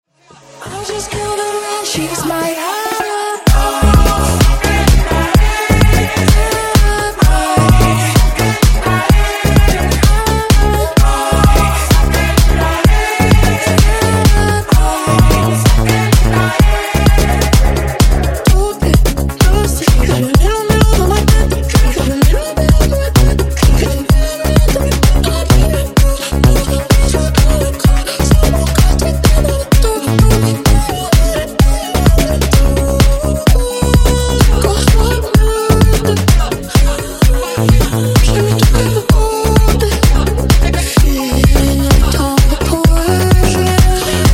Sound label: Funk Clean 128 Bpm